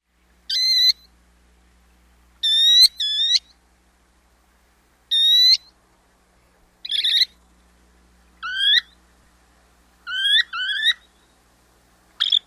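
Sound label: Baird's Sandpiper calls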